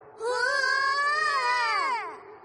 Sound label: woooww